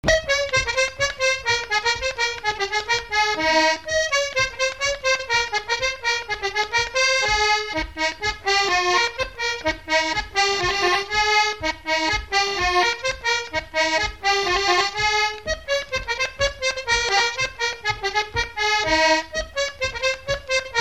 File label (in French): Polka; Barbot; Vire